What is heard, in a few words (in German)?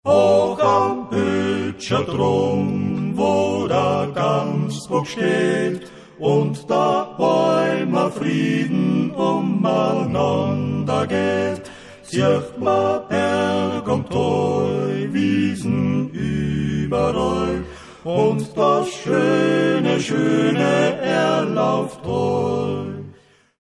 maennerquartett